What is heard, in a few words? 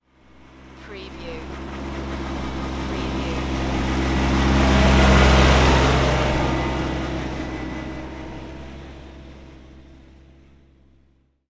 Tractor Deisel Drive By Center